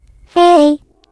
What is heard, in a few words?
turret pickup